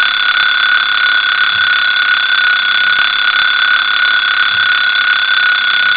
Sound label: clock